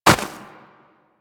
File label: Walk Snow, Footsteps; Warframe; Operator